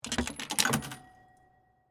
Arcade Game Bowling, Technology, Arcade, Game, Bowling, Machine, Insert, Quarter, Vintage; BlastWave